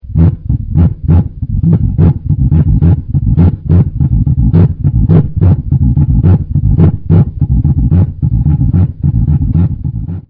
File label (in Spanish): zambomba; tradiberia